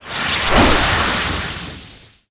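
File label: Attack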